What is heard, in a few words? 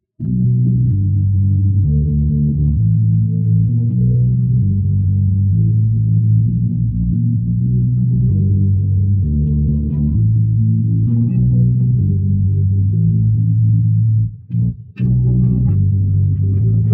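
bass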